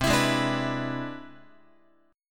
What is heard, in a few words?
C Augmented Major 9th, Guitar; strummed; JGuitar